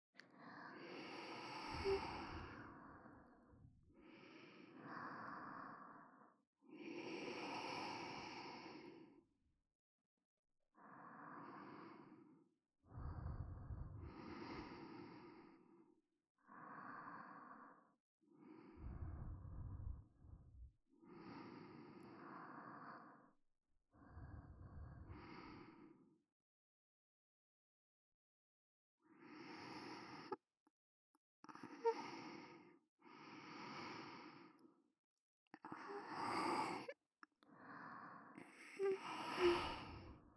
寝息ループ, ASMR; KU100; メイドさん

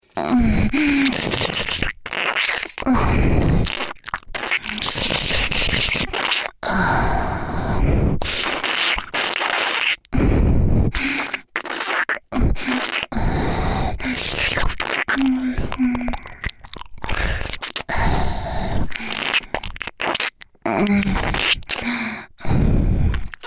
右 耳舐め; ギャルフェラッ!!学校は援交ギャル風俗店ッ!!

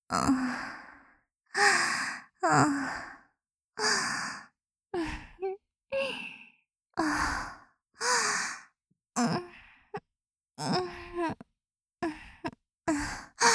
キス; 【マゾハーレム】

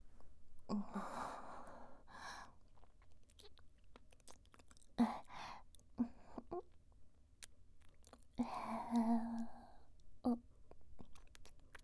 キス; 【マゾハーレム】

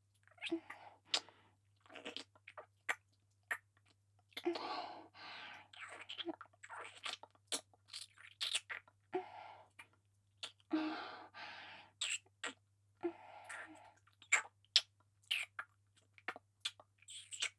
乳首舐め; 性欲旺盛な陸上部の先輩後輩JK、ベロチュー青春溺愛えっちでコーチの汚ち◯ぽ生ハメ特訓♪

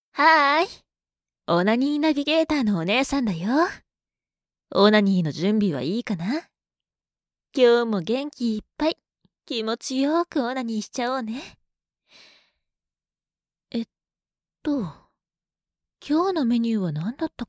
お姉さんのリズム乳首オナニー; 「シコシコきゅっきゅ」

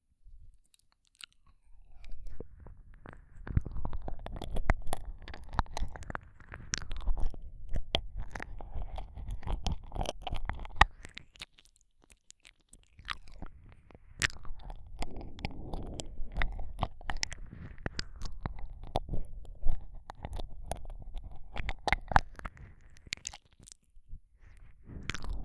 ゆっくりじっくり耳舐め, ASMR; 【超耳舐め特化】夜のたっぷりしっとり耳舐め安眠ASMR】

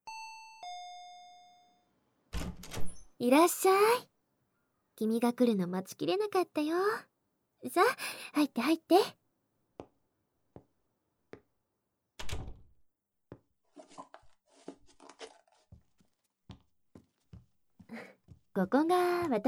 初キス＆本気フェラ; 幼馴染で保健のお姉さん先生; KU100

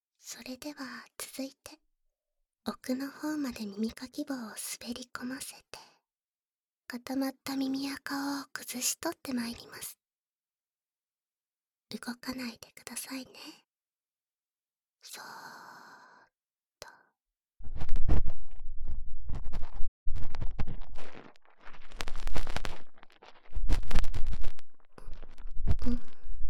左 奥耳かき, ASMR; 妹の友達の大和撫子メスガキのやんごとなき耳ほじりで俺は敗ける。

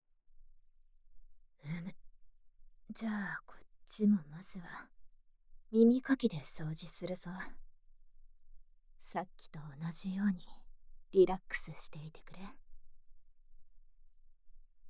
竹耳かきで右耳の耳掃除; ママといっしょにおねんねしましょ 〜クールママ編〜

